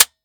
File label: empty; l2a3; svencoop; ins2